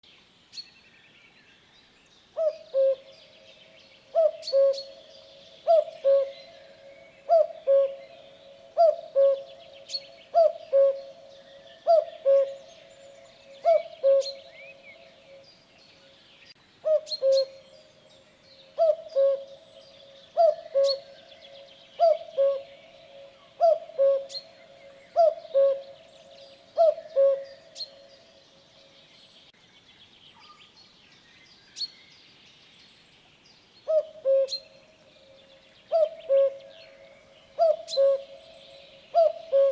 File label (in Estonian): Kagu